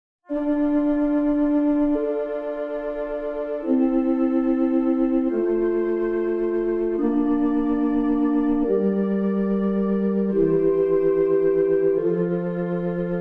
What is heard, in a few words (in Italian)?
Esercizi di contrappunto a tre voci; Dritte Gattung